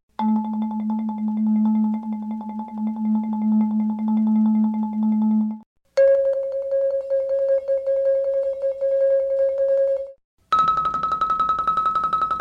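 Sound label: marimba, Mellotron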